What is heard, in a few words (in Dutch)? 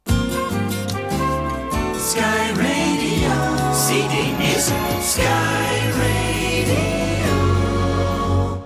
omroep jingles